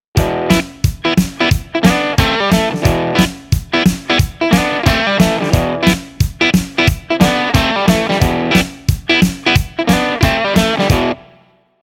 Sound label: Double Stop Riff, guitar